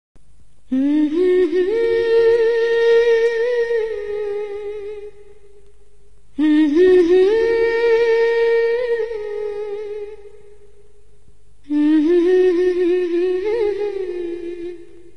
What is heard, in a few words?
Humming Tamil Ringtone; Jyothika